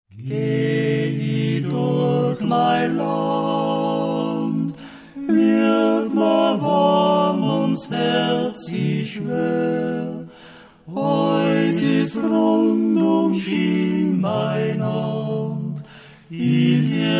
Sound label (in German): maennerquartett